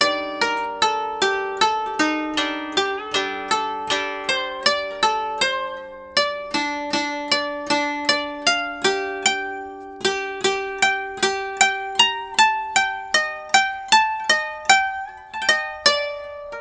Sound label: KOTO SOLO